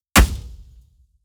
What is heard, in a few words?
Impacts SIMPLE; SoundBits